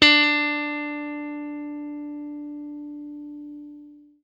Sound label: BLUE POP, Guitar & Bass